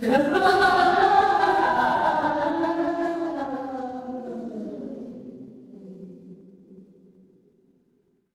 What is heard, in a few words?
crowd laughter